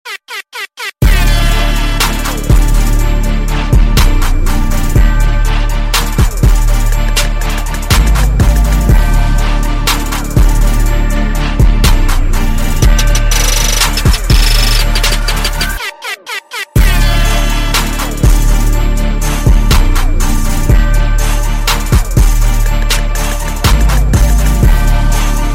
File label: instrumental